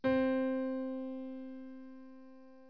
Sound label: piano C4